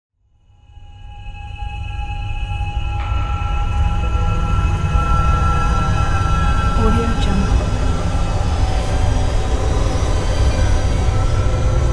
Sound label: paranormal activity atmosphere